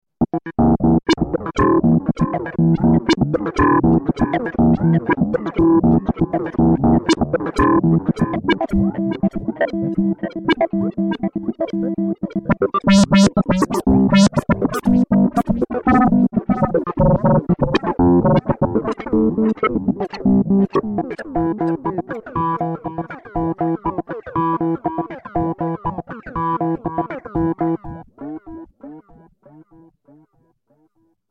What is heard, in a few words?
Arp Eco